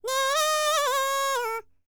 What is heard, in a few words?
BULGARIAN, World Voices, BALKAN